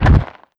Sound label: walk dirt